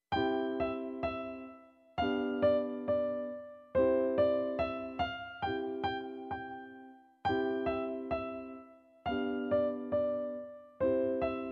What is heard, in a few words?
German Folk Song chords